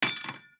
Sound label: glass hit; teardown ps5 windows xbox series xs gamerip 2022